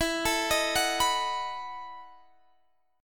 E Major 7th Suspended 2nd Suspended 4th, Guitar, EM7sus2sus4 chord; arpeggiated